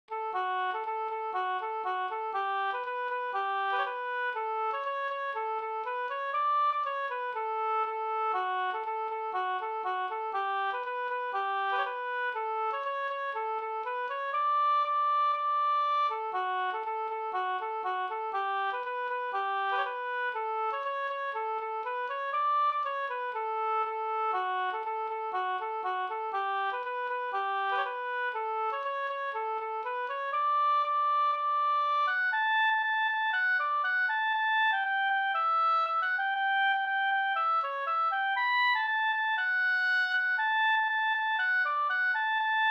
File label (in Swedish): polka